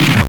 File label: fail